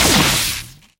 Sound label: stunned layer; kidnap; scripted sequences; eli